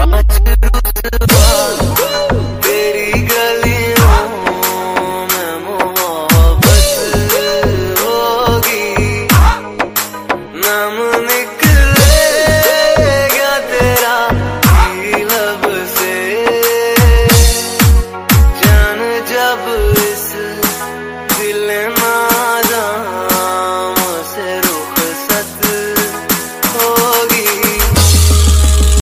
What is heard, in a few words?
dj remix bollywood ringtone; bollywood ringtones